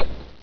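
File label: machinegun bullet by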